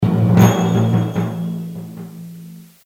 Short stumple end; Kaiju Khaos

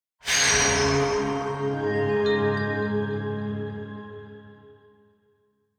SFX Win reset; Neoverse

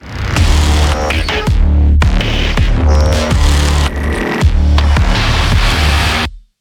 Flesh & Metal light; Doom

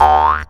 boing; Spanish; water balloon

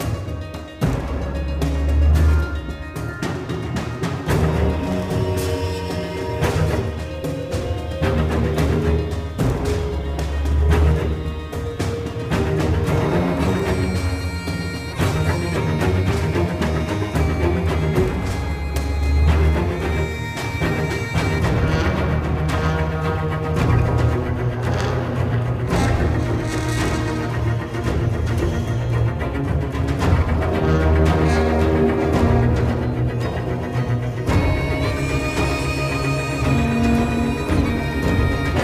combat scotland loop

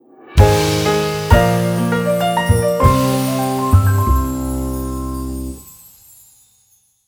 Room Complete; Ellen's Garden Restoration; android

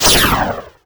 Bonus sound; blastmorph pinball; xbox series xs

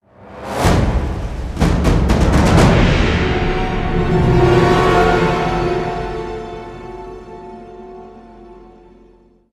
Combat Intro

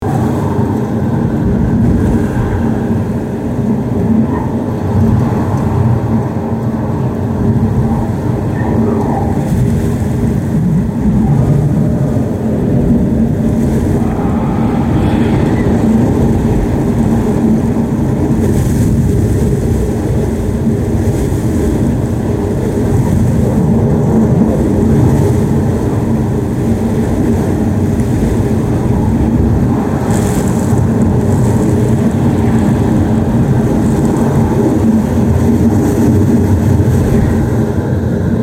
Amb Blizzard Shuttle Int

